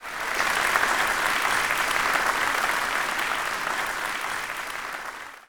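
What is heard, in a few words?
Basketball Crowd Injury; Crowds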